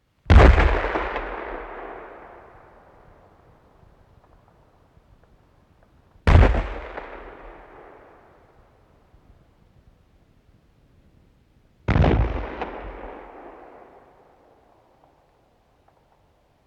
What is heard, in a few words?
Tykki Laukaus Big Gun Cannon 76 Mm Single Shots Gunshots